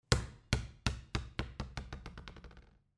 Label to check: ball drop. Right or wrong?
Right